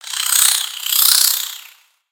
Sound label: Spider Dazed; Lego; creatures mechanical